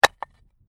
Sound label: Stone Slab Impact, Rock impacts; Cynder impact or Brick impact, slight rattle, good stereo definition; Rock Sound Effects; LynneMusic